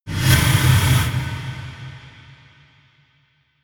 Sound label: hit hiss dark, Hits/Impacts